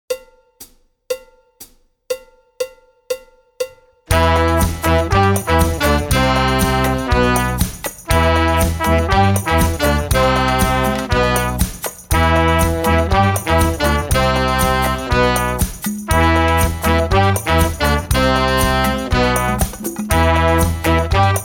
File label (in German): Begleitung